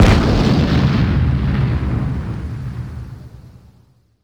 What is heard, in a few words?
turret aa missile explosion far